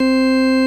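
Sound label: ORG Vox, Continental organ; Keyboards of The 60's and 70's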